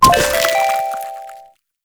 Hex Dog Tag Pickup; Warframe; Nineteeen Ninety Nine

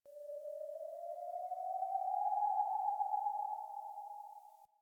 Venus Landscape Distant Corpus Texture, Ambience; Warframe; Venus Open World

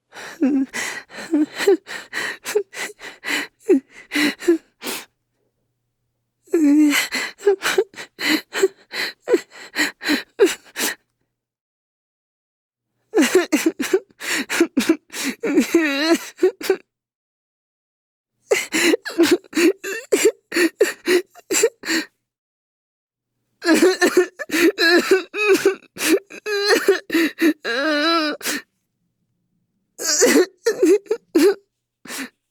Student Male, Whimper; Warframe; New War; Zariman

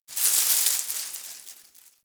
Derelict Tree Shake; Interior; Ambience; Warframe; Orokin Derelict

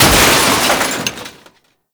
Car Metal Smash; Warframe; Nineteen Ninety Nine

